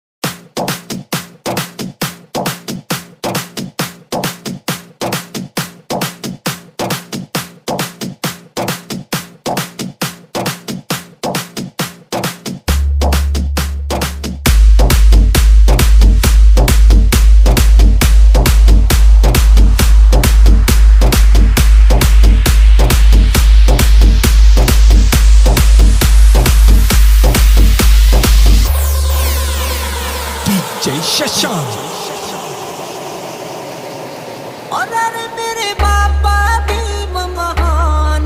Edm Trance Mix; Ambedkar Jayenti 2024

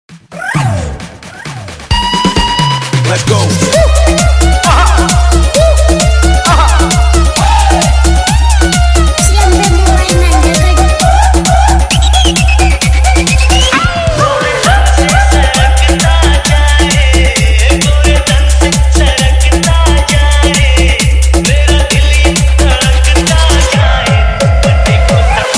Hard Bass Vibration Mix

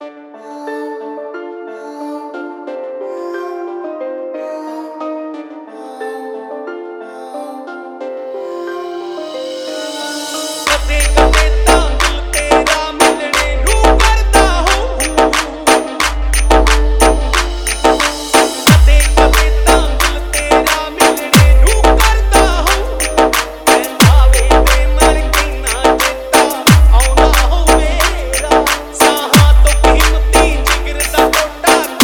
Remix Punjabi Song

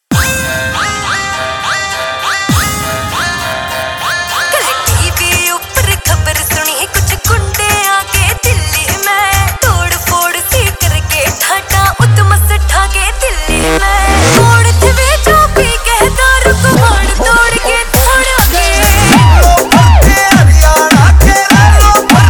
Hard Bass Remix

